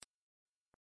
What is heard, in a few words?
High Frecuency